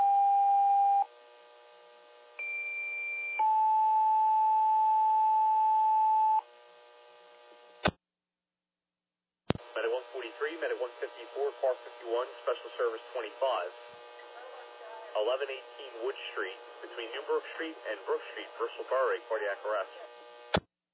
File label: alert page; bristol